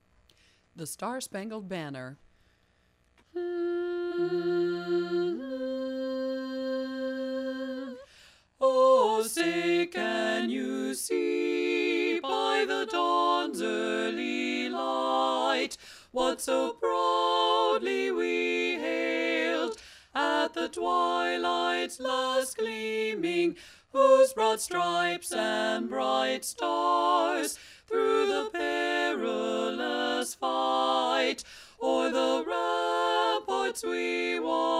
SSB bass miss; SSB bass omit

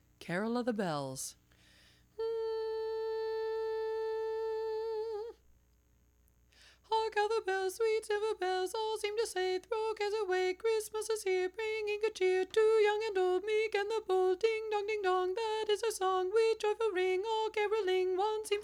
Carol Bells tenor alone

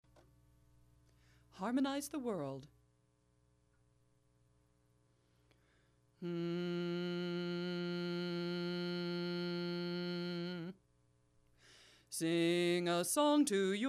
Harmonize bass alone

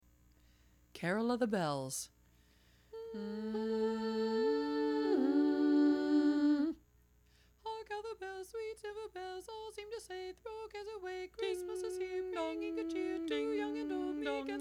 bari dominant; CarolBells

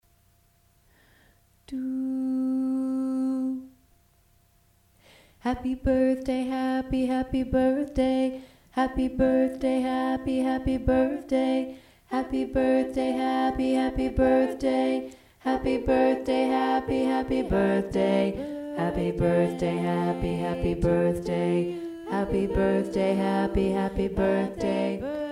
Happy Birthday Doo Wop Bass

